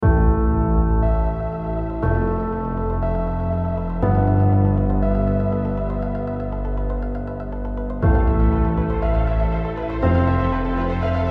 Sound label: Cinematic and Epic Opener